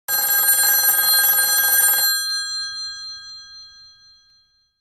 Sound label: Phone Ringing